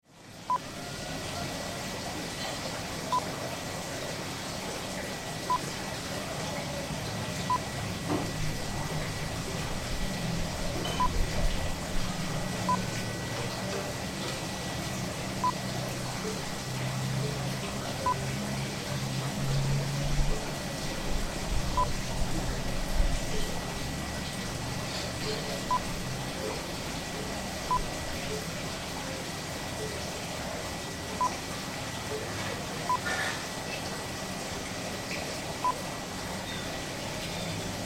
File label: Bali Villa Ambience